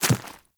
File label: Small Walk Dirt, Footsteps; Enemies; Warframe